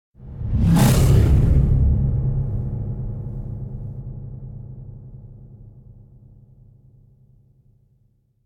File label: Whoosh Heavy Air Rip Tiger Growl, Drum End - Longer; Hollywood Edge; sound designer's toolkit v2